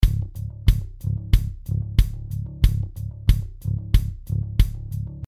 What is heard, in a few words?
Kick